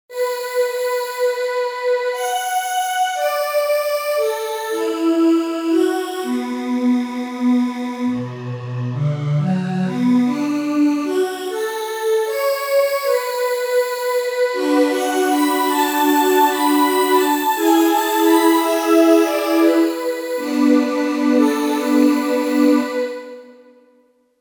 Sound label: Vento Voxx, Roland JV-880; Factory Patches